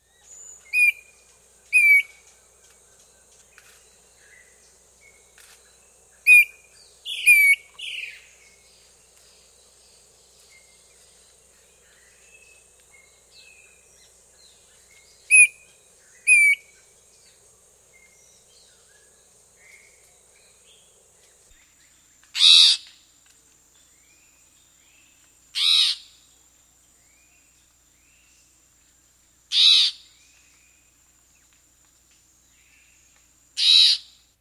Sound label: Chestnut-Backed Antbird; S. America